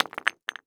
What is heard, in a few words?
Crash Block Concrete Piece Dropping On Cement; crashes; AdobeCC